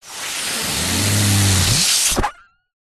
household vacuum item get sucked into hose suction